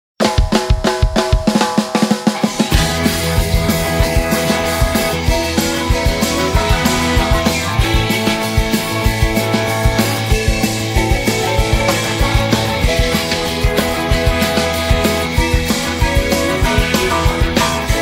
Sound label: Accompaniment Only